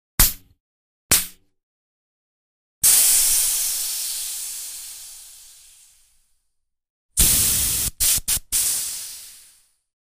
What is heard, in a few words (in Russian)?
spusk vozduha iz kolesa